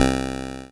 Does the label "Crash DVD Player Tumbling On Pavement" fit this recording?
No